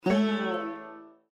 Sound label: Windows Battery Low; Windows 7 Sounds; Raga